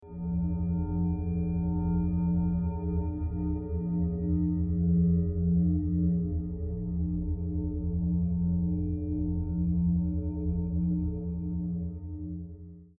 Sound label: Ambient Electro